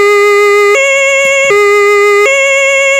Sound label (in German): Polizei Stadt, sirens german